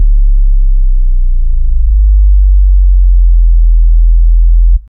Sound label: sub bass pulse